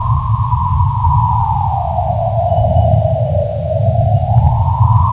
WIND; CHASM

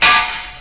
anvil

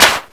arrow

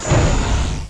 Pink Death; Icebreaker

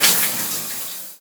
shower Fadeout; Singles Flirt Up Your Life

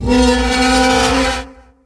talos hit; DAoC

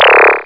PHASER; SIMON

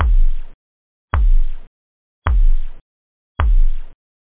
tone; drums; techno bd

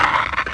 dead air; hellboy

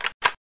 pickup; TNP demo

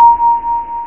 Chime; IN CONTROL Blinker

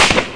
weak gun; QUAKE

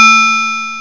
belltower

